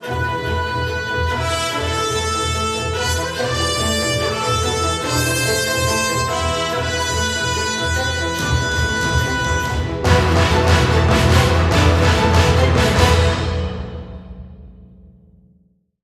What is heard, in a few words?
BGM Field Start Building; God Eater Resurrection; PlayStation Vita